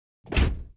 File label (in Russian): shkaf metal closed, doors; FEAR